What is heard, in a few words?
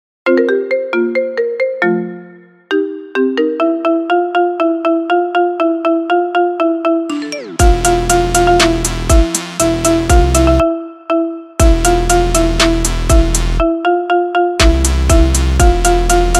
marimba ringtone